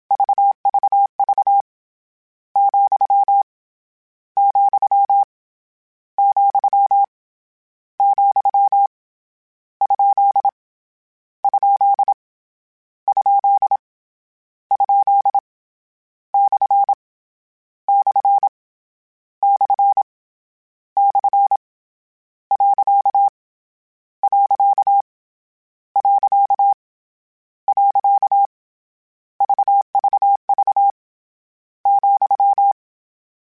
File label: Morse Code, CW Code Groups; Mixed